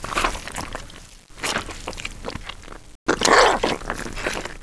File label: grapple cough